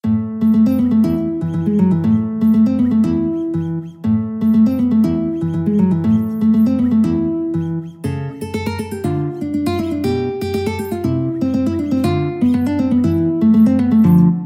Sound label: caprice, guitar